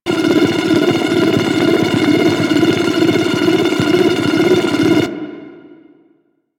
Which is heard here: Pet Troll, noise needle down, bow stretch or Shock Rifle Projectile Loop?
Shock Rifle Projectile Loop